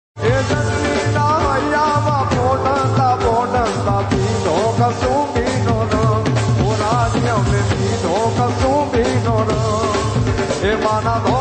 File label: gujarati ringtone